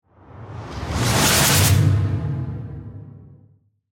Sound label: Whoosh Laser Shots, Whoosh, Laser, Shots, Accent, Transition; Production Element, Imaging Element; BlastWave